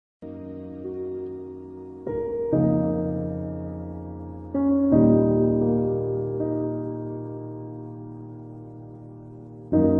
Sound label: americana piano